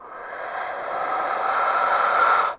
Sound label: attack; PC Gamer IT CD 45; SMAN DEMO; baddys; deadfem